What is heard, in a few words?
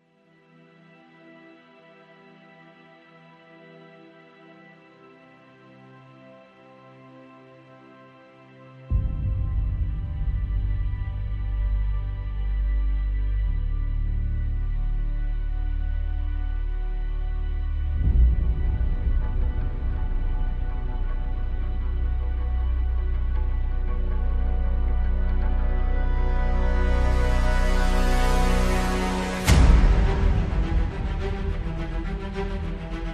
No Vocals